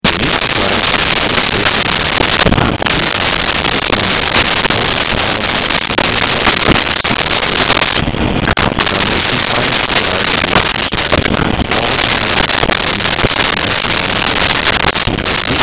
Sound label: taps without